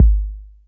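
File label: bass drum 808 Lo Color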